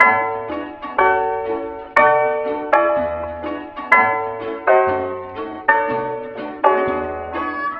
piano, Phonky loops

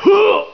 scream male choke; ooh ahh

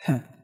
"heh", female; ooh ahh; heh huh

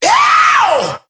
"yow", voice ooh ahh

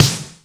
Snares, Real

